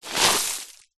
stones granite gravel and dust kick foot disperse roll; foley; zapsplat